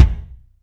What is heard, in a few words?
DM IN THE KICK